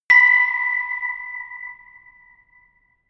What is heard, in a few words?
notification glockenspiel creepy single